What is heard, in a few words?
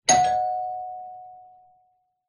Door Bell